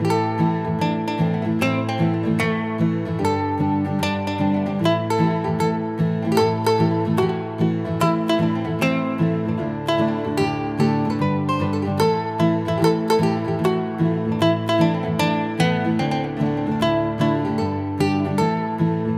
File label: MUSIC DANCE